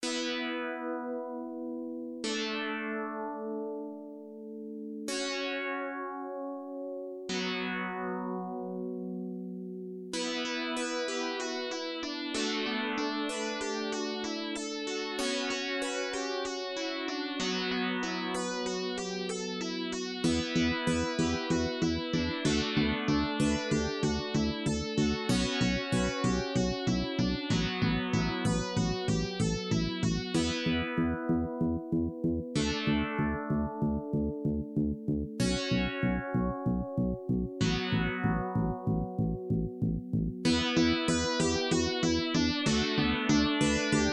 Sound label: Gameover; B.O.T.S and the Robofriends